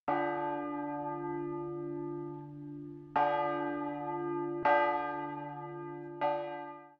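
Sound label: Bell D1, electronic bells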